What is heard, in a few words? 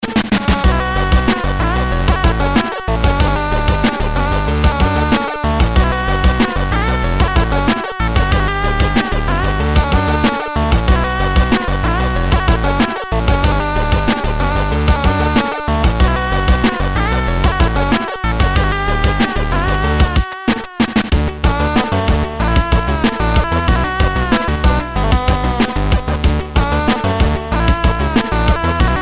Keygen 8bit